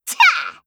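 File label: attack, Voice Line, Korean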